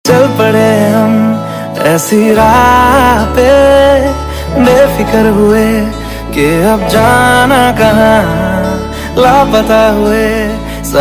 ringtone

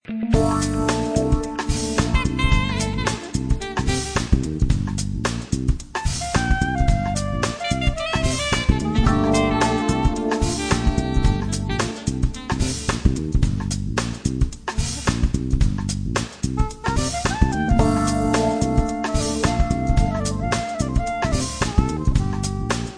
romantic

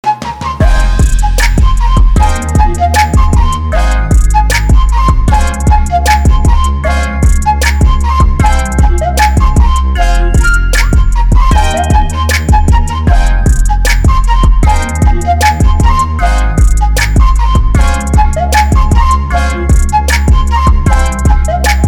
crying flute; reklama yota

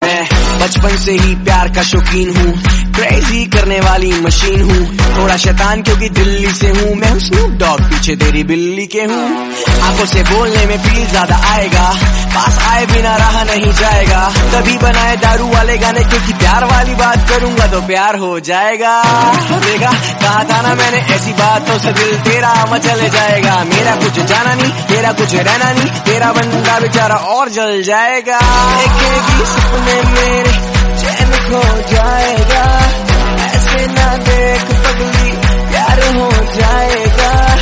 punjabi

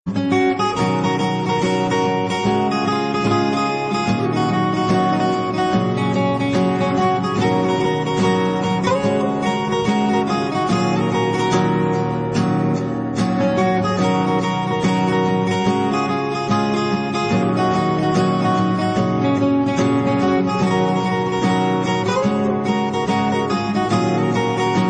acoustic guitar

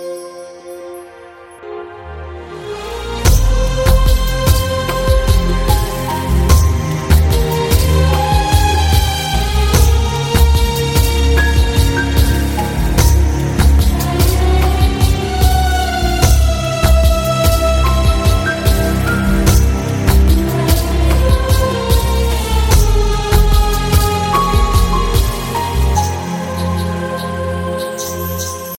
romantic music; goliyon ki raasleela ram leela

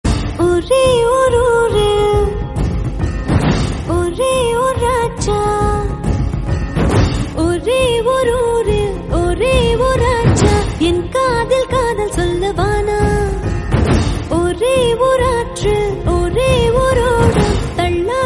tamil doubb movie song ringtone

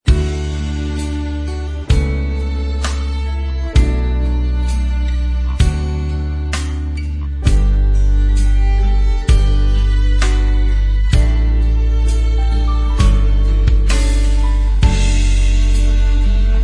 romantic

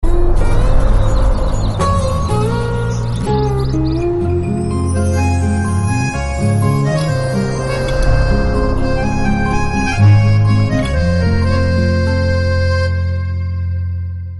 touching; charlie

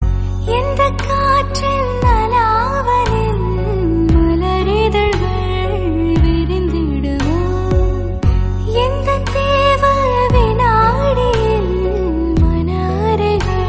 cute romantic hit

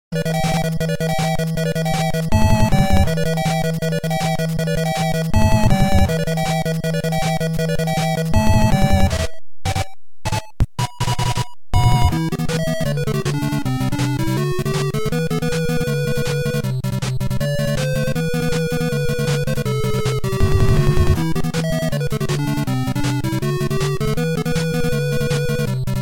opening music; goal 3

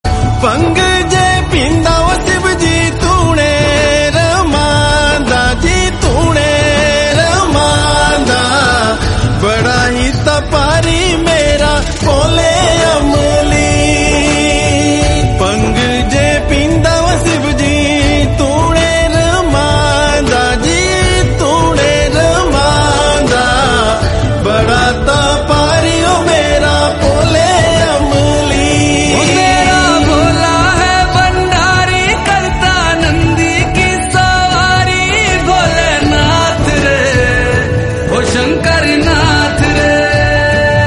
punjabi

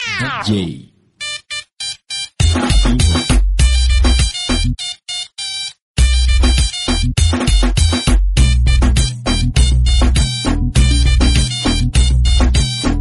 dj latin

